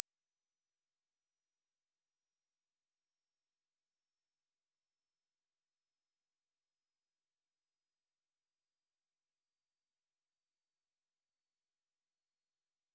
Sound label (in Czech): 10 az 18 kHz